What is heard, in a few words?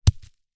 impact rock fall hit ground forest floor